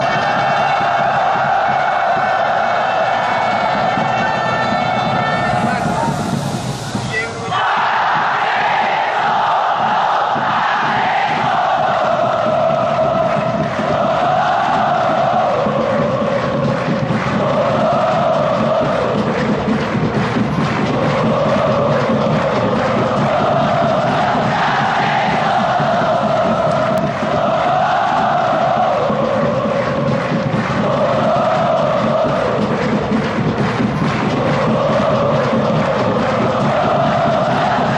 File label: oo oo, fanchants; persis solo